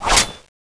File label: strider hit wall; cstrike